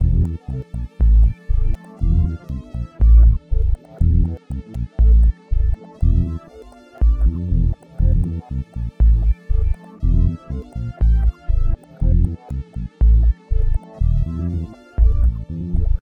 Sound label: paused; Data Jammers Fastforward